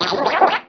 Cry; Pokemon Essentials; Pokemon Circon BETA 2 v1.8

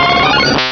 Cry; Pokemon Essentials; Pokemon Circon BETA 2 v1.8

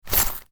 wallet; easyrpg; WitchsHeart

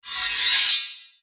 sword drawn; easyrpg; WitchsHeart

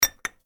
saucer caffee place; easyrpg; WitchsHeart

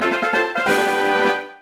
Voltorb Flip Win; Pokemon Essentials; Pokemon Circon BETA 2 v1.8

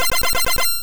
Ice Punch; Pokemon Essentials; PKMN Estelar 1.3

